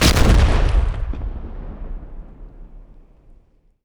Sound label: explosion, grenades; Frag